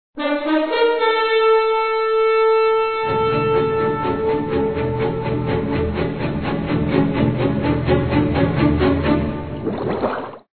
splash Music; spongebob squarepants invasion of the patty snatchers

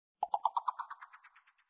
start Wave; Bubble Tanks Tower Defense

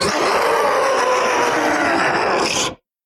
Jump Scare Vocal; The Town

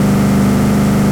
Bmw X5 High

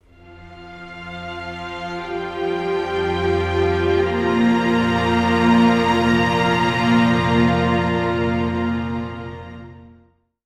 Ambient; Rise of Nations: Rise of Legends

